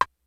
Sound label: snares; Kid Nepro; Elite; KICKS SNARES